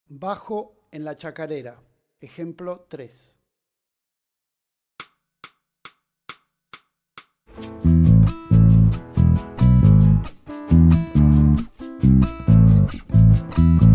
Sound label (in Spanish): Bajo en la chacarera